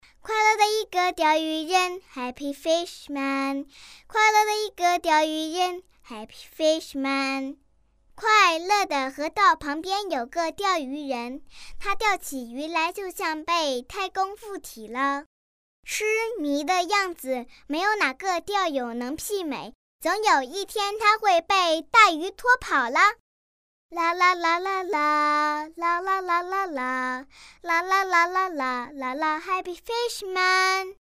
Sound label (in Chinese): 女 女童声 歌曲 钓鱼人